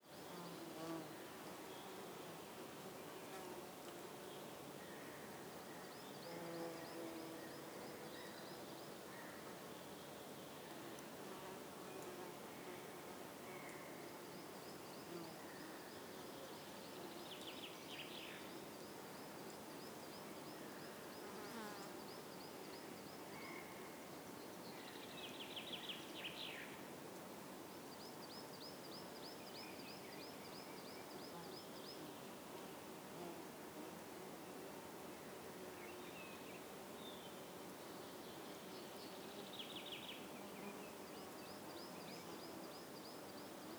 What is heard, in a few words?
countryside quiet forest bird gull call; Rural zone; Borys Peter